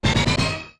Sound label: Message Go Mono; Narrator's message finishes